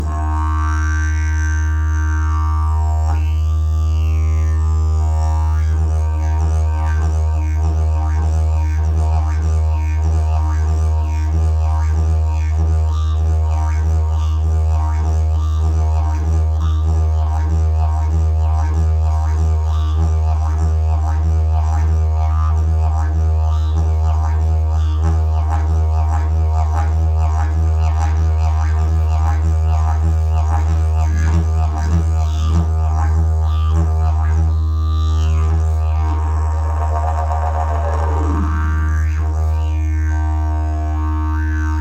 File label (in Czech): jasan, didgeridoo